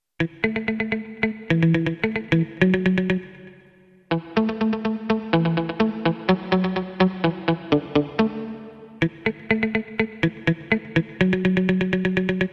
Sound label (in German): Tonrepetition per Harmony Tremolo